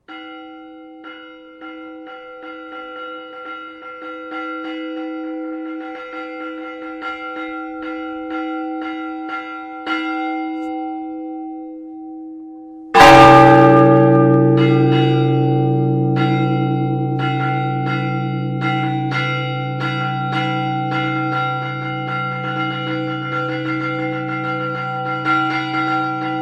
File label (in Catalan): campana